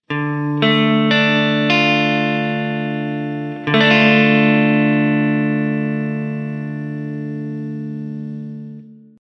Ré mineur

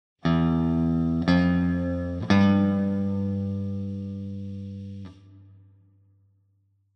MI FA SOL

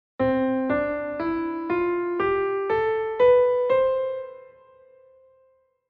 Gamme diatonique

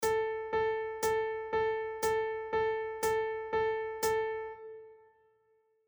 Binaire croches

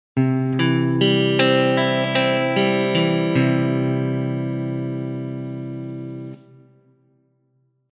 DO Majeur 4 Cordes Arpèges

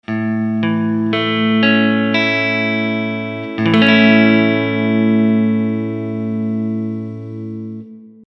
LA Majeur

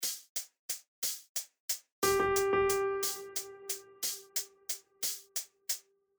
Sicilienne Ternaire

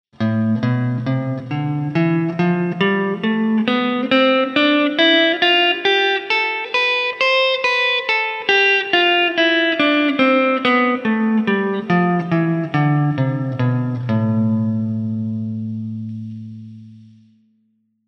Exercice Déliateur Doigts

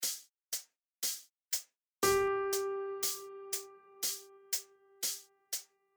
Ronde Binaire

